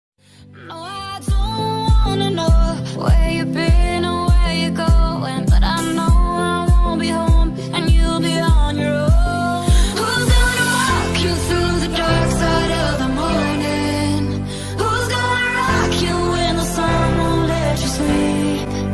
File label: english ringtone